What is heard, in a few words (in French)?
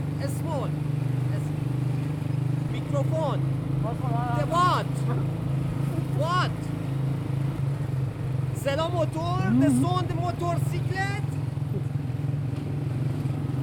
najafabad virée en scoot